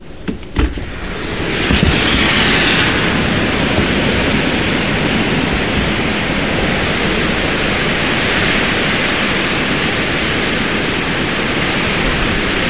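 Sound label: storm noise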